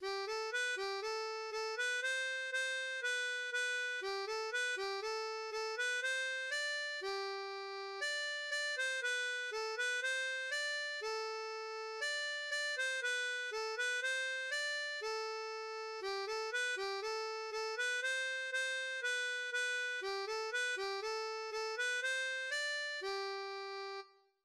J ai du bon tabac, harmonica; Sol

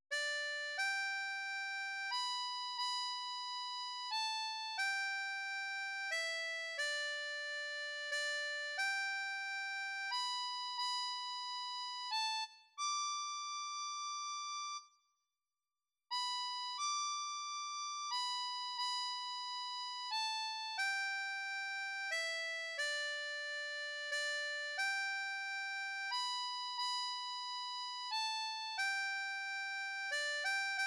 Amazing Grace, harmonica; Do

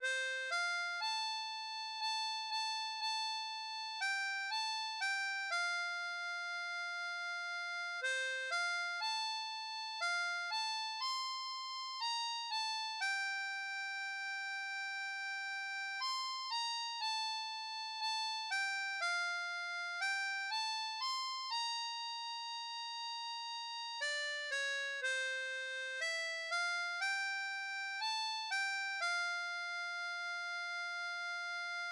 Red river valley, harmonica; Diatonique

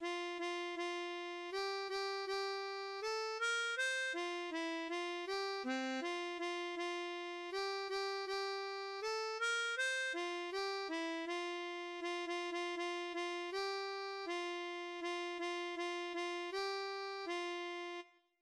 Sur le pont d Avignon, harmonica

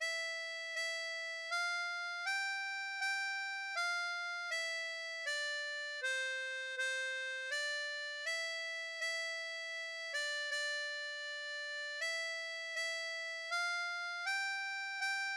Hymne à la joie, harmonica; Sol

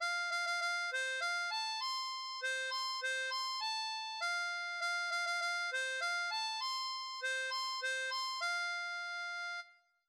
Pomme de reinette, harmonica

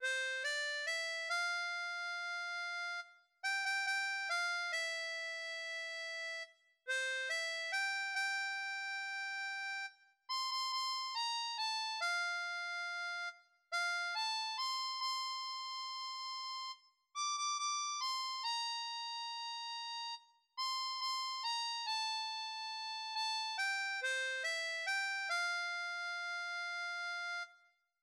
I m on my way, harmonica

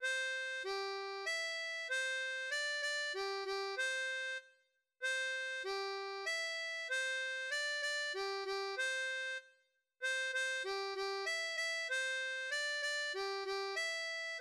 Dans la troupe, harmonica; Sol

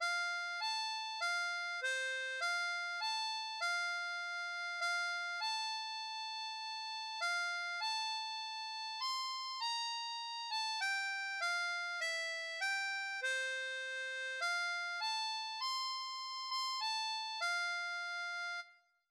Whiskey Johny, harmonica; Diatonique

